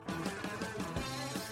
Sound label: Black dog quiet